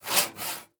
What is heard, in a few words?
construction saw